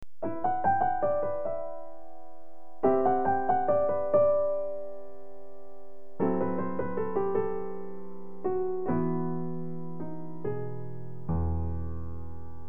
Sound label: improvisation